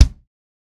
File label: Drum Kick